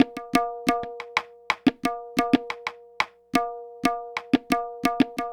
Tabla Perc; Percussion; Beat Components

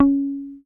HOFNER MUTE, Bass

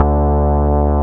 BAS MINI, MOOG; Sound & Vision - Gigapack II CD 1

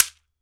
SHEKERE, African Perc; E-MU Producer Series Vol. 7 – Old World Instruments

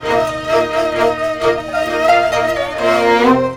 Oiental; Best Service; Ethnic Journey Sound Cube

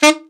ALTSAX D3; Best Service; Alpine Volksmusik

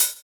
Hhat, Hihat; Equipped Music; Loungin House

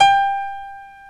GRAND PIANO; OMI - Universe of Sounds

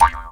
E JAW, Primitive Instruments; Big Fish Audio - Didgeridoo and Other Primitive Instruments; JAW SIMPLE

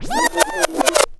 Spinback; Jungle; FX; Zero-G Jungle Warfare 1, 2 & 3; 90 s Sample CDs

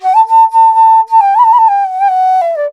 INDIA ALTO

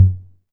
tom lo, Alesis DM5

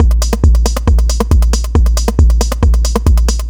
137 drum loops; DarkNights; M8; Electronisounds

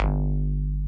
BASS G1, Yamaha VP-1, SYNTHBASS; Music Store

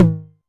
Tom Hi, Novation Drumstation; DrumstationV1

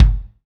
F Stand Kick, Fusion Kit; Akai MPC500

